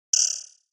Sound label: Button HF Elect Sprin